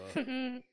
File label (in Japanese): ふふーん; INFINITE UNDISCOVERY; ルゥ